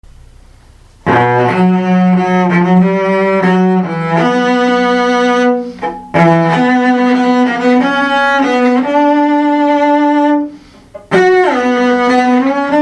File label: fast theme on the cello; sherlock